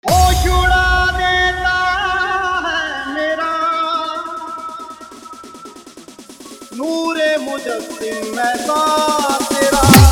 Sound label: Hard Bass Faadu Full Dance Mix